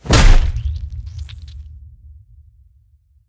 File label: flesh; CosmicRage; combat; bigtail